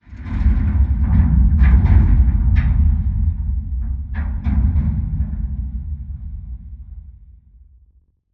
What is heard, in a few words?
dish crane move start metal stress; Titanfall 2